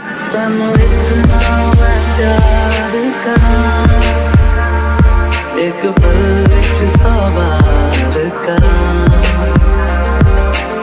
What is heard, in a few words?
hindi music ringtone, bollywood